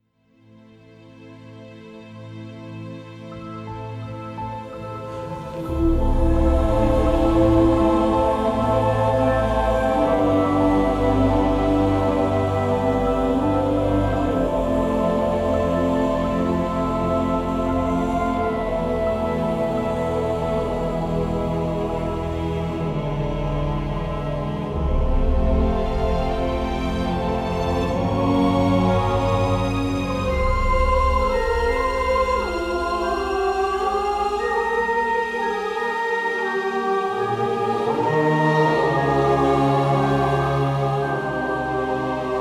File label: Lullaby